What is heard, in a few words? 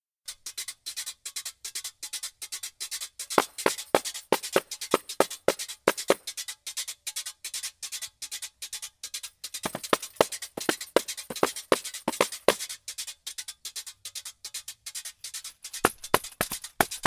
Salve Rhythms